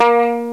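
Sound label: BASS GUITAR; FAIRLIGHT CMI IIX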